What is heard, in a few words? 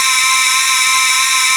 VOX NOISE C4; E-MU Formula 4000 Series Vol. 2